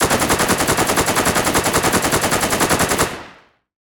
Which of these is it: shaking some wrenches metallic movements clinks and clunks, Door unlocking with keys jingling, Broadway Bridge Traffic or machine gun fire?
machine gun fire